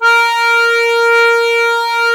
DIATO2 A#2, Historical Instruments; USB Soundscan vol.23

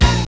DISCO FX; Zero-G; Funky Elements

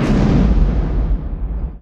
EXPLO; Best Service; Advanced Mega Effects

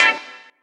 CHAK CUT; Rawcutz; Nu Forms

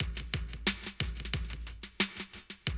Classic DrumLoops

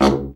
BRA Bari, SAX; Best Service Gigapack 1; BR SAX 2

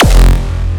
Hardstyle, kick

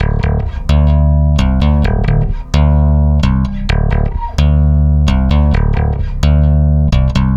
Stick Loop, Stick Bass

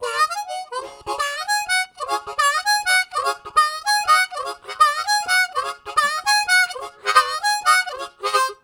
Highishly, Harmonica Riffs; Zero G

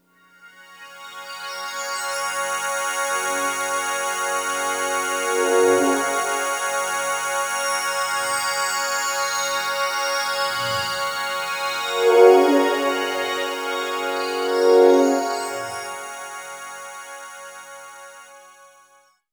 Hi Complex, Complex Wave; Sonic Foundry; Sony Creative Software; James Johnson Spektral Minimalism

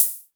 Hi Hats Open; LIMAT Div Dept

